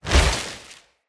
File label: Casting Device Cast Right